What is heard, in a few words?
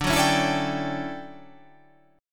D Major 11th D down